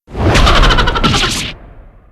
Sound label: laugh; breakdown